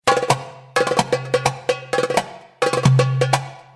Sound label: Tabla